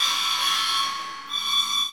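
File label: 124 BPM; RHYTHMLOOPS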